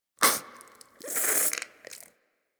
Wheeze Close; Ambience; DE; Warframe; Infested Eris

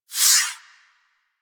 Fighter Fire Whiz By; Warframe; DE; Vehicles; RailJack; RailJackEnemyShips; Grineer; Space Fighter Rager Agent

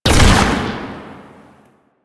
Concrete Archgun Shot; Warframe; Archwing

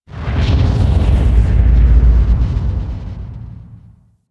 Distant Electric Explosion; Ambience; Warframe; Corpus Railjack

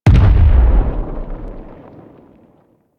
Golem Step Far; Warframe; GolemRemaster; Plains; Footsteps

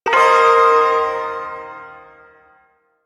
Shawzin Blues Chord; Warframe; Duviri Ersatz Shawzin

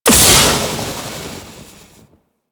Archwing Movement Malfunction, Vehicles; Warframe

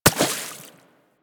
Bullet Impact Water; Warframe; DE; Weapons

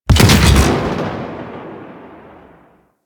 Mortar Launch; Warframe; Venus; Venus Terra Mortar Moa; Abilities

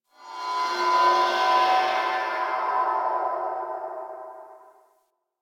interior cave tonal drones; Ambience; Misc Indoor; DE; Warframe; Infested Eris

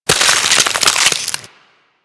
Ice Freeze Hit Effect; Warframe

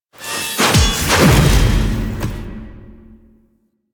Riposte Nikana Finisher Foley; Enemies; Finishers; Drifter; Warframe; Duviri; Dax

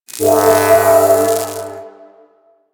Sign Start; Ambience; Props; Warframe; The New War; Zariman; Quests

